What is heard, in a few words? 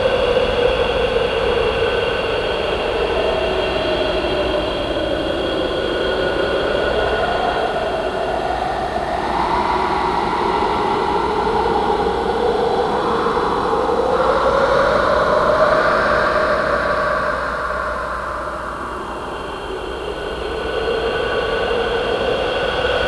wind; skaarj